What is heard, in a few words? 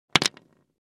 Dropping chalk stick; Chalk and Chalkboard